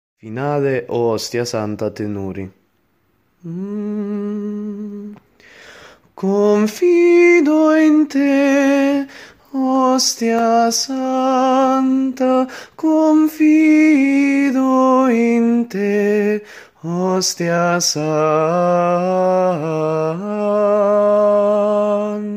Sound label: O Ostia Santa Tenori; ARCIDIOCESI DI SANT’ANGELO DEI LOMBARDI-CONZA-NUSCO-BISACCIA